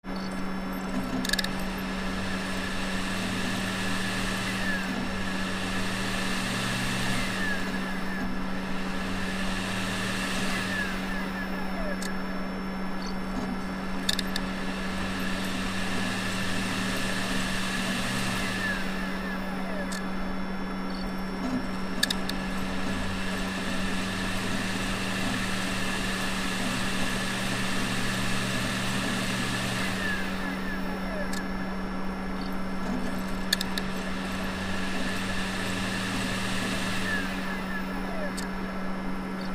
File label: Computer Cd Rom Load